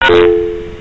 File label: tone bong